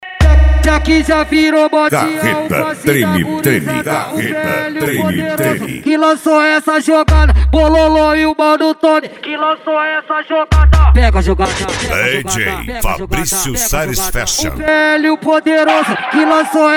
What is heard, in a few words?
funk mega bass; carreta; mato grosso; carreta treme treme